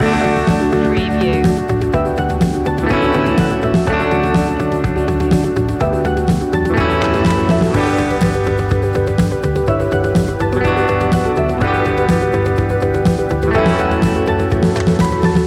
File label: starting something new loop